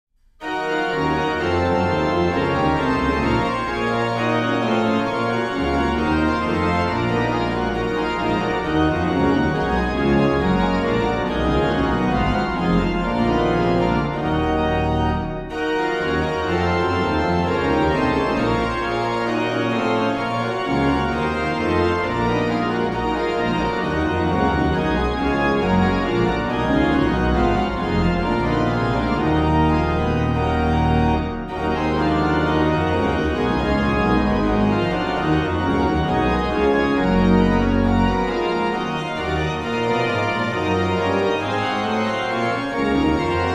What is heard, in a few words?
Bach Organ Works; BWV 625; Christ lag in Todesbanden; Orgelbchlein